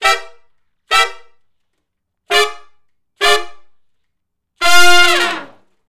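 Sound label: R&B Riff V. IV, I (F) harmony, Horncraft for R&B; Crimson Blue and Fabulous Horncraft 4 RnB; Sections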